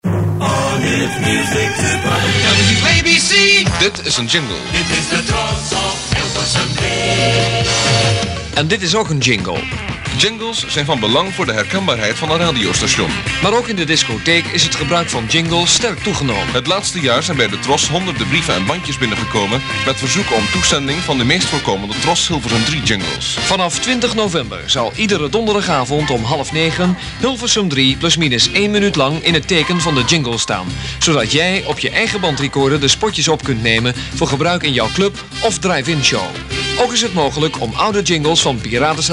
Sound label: Promo jingle; Ferry Maat